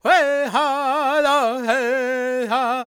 C# MIN, World Voices, LAKOTA

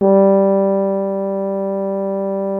BRASS 2 G2, ORCH BRASS; Greytsounds VOL-1

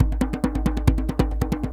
DJEMBE GROOV; Best Service; World Colours

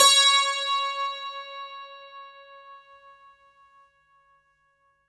santoor c4; World Instruments 2; STRINGED INSTRUMENTS; PS SANTOOR SABERI

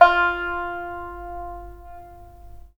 SAN XUAN F5; Spectrasonics; Heart of Asia

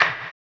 HAMBONE, Vocal Blues Soul; Spectrasonics; Planet

